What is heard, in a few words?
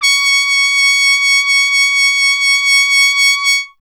Trumpet C#0; Miroslav Vitous - Solo Instruments VOL-1